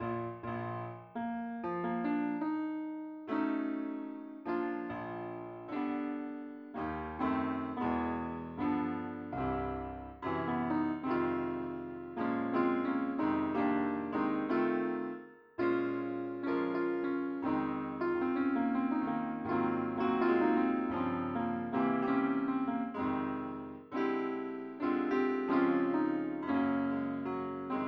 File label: piano